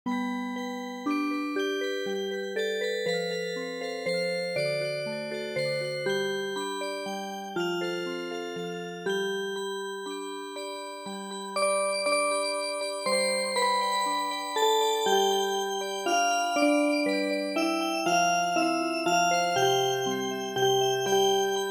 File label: Music Box, traditional; The Banks Of Claudy